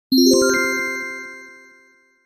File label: Mail notification